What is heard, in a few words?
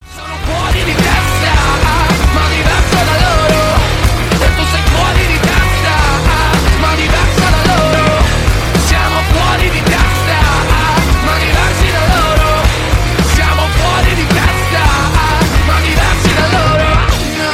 rock ringtone